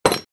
Metal padlock set down on wood surface; locks keys sound effects

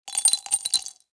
Glass medicine jar putting pills inside

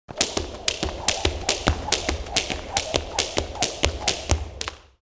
Boxing jumping rope

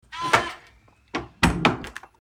Hotel door keycard unlock and open; locks keys

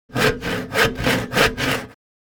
Sawing wood fast handsaw

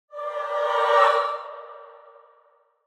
Scary transition halloween suspense

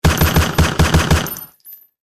Pistol semi automatic multiple shots

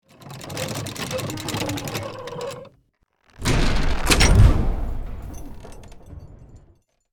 Catapult charging and shooting medieval

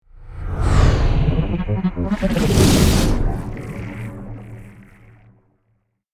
Time warp slow motion portal; energy

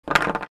Small wooden blocks set down; wooden sound effects

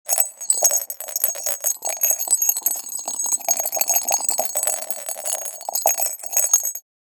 Shaking a thin glass sphere with a bell inside

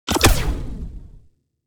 Sci-fi weapon laser gun shot

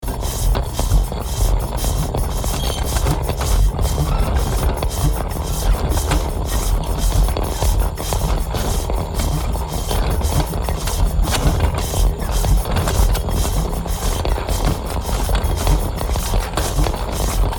Sliding machinery rotating belt

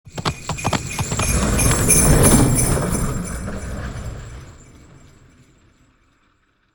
Magical santa sleigh christmas passing by

